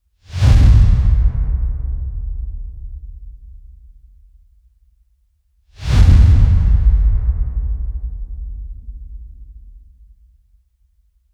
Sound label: fast low whoosh, air